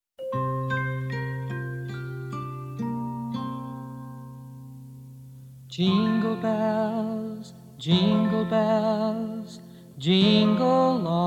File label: Jingle Bells, Country Christmas